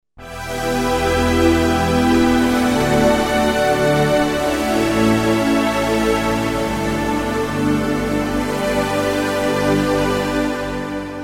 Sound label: pad lush, yamaha sy99; synth